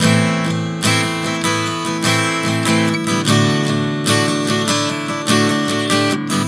Progression 074 Am7-D, Acoustic Guitar, Chord Progressions 074 bpm; Sonic Foundry; Songwriter Acoustic Guitar Companion